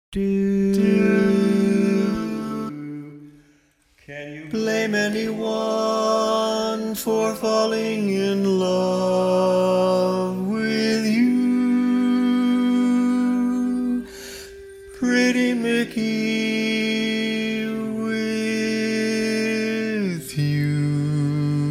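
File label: Baritone, barbershop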